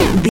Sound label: retro impact hit